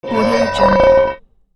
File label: metal manhole